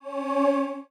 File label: Sound Special Pattern Light